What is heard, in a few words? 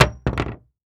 Industry Hatchet Dropping On Hollow Wood